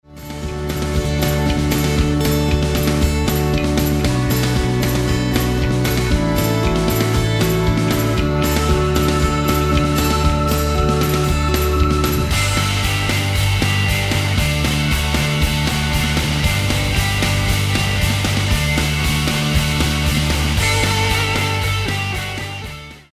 Modern Pop

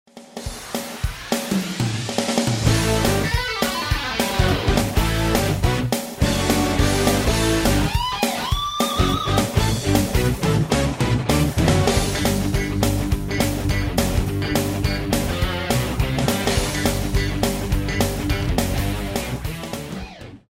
Rock

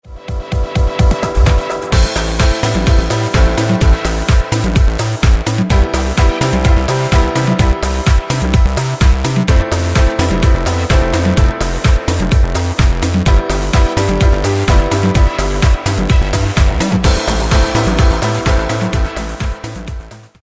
Techno Dance

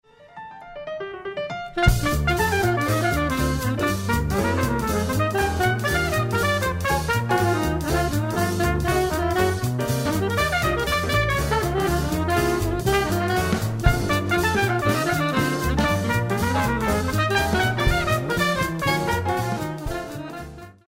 Traditional Jazz

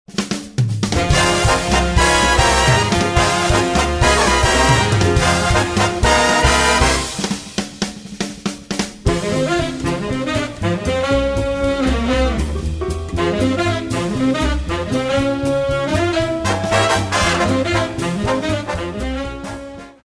Big Band Big Band Swingin Easy

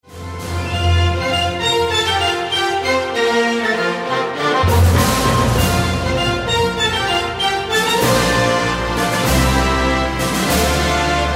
Sports Champions

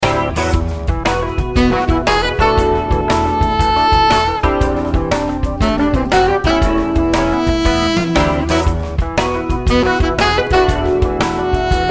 Modern Jazz

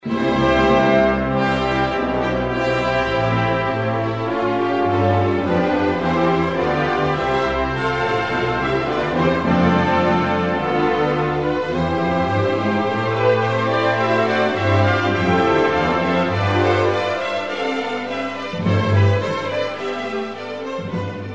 Classical

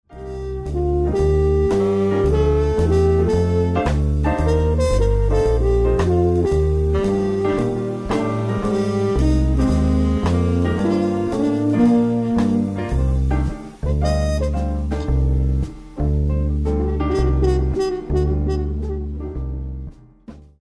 Traditional Jazz

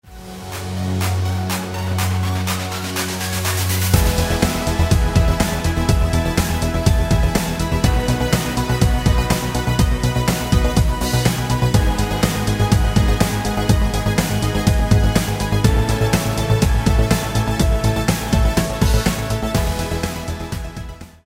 Techno Dance

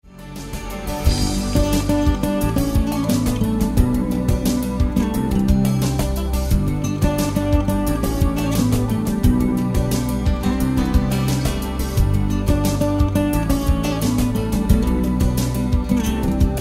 Light Contemporary

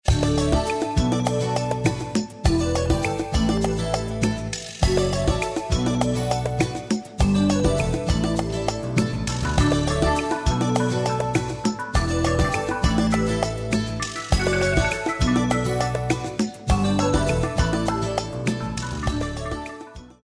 Island Island Medley

